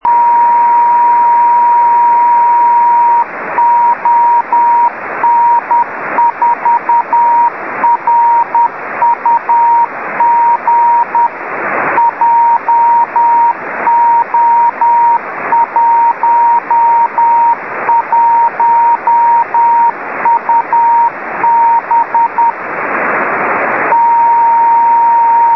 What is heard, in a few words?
3cm tropo; ON4RUG